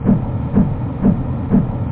ventil

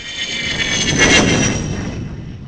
Avion à réaction; MOBICLIC 14; courrier

